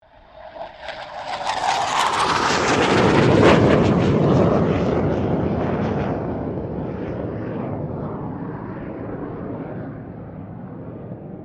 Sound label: BAE Hawk 127 pass; Airplanes; Vehicles